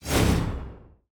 Whoosh; portal quest; android; Paladin Skill2

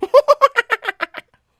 Monkeyboss Laugh; angry birds rio

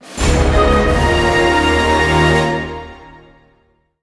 Container Discovered; Transformers Devastation

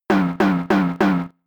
Belly Drum

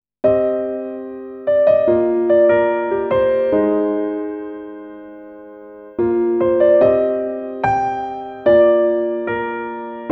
Silent Stars-instrumental ver

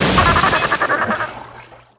Cage Break; angry birds rio; Pink Dolphin Cage Break

